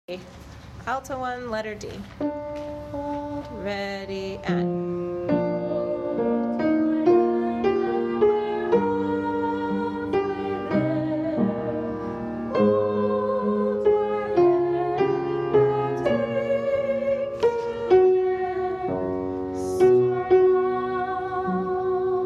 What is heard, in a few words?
alto 1